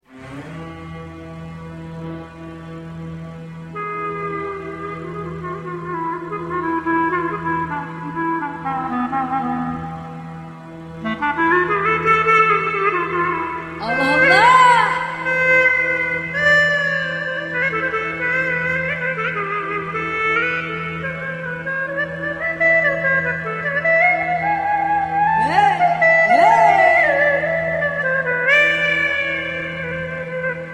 pa1x klarnet ud

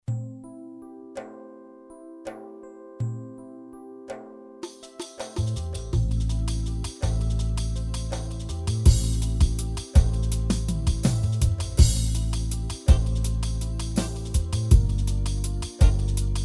vahde, yamaha psr a300tr v2 ritm

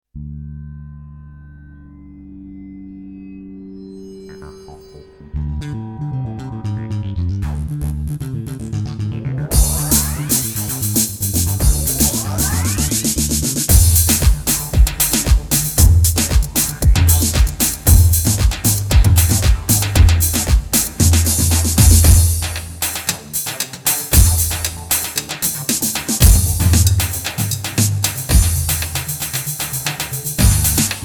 halay, gw8

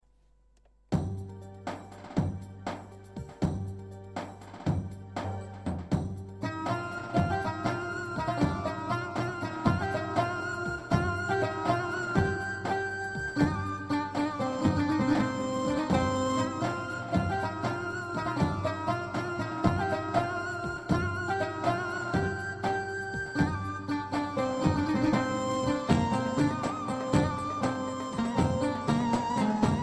pesrev, korg pa 55; kara tren

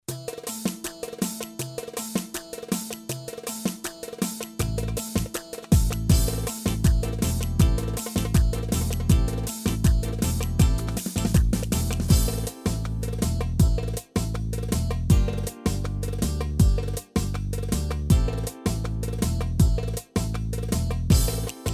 misket, yamaha psr a300tr v2 ritm

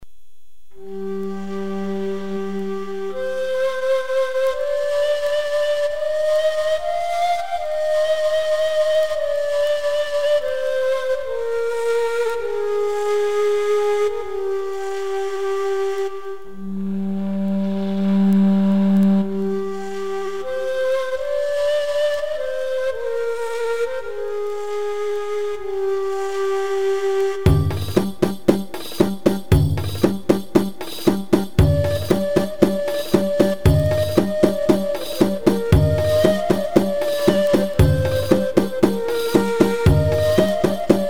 tasavvuf la ney

